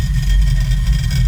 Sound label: wheel spin; ui; economy